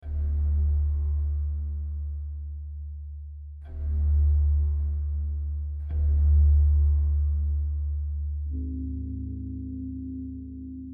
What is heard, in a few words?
Tension System; the last of us